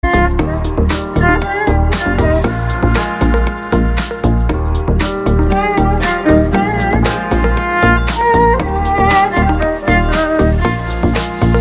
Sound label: arabic iphone ringtone